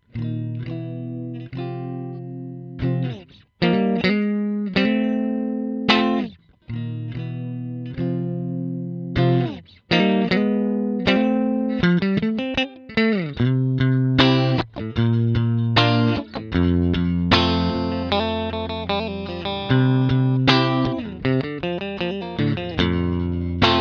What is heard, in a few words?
Clean; SH2+SH6 split